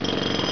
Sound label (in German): klang